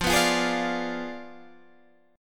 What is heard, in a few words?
G sharp Diminished 7th F, guitar